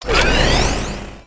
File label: ripsaw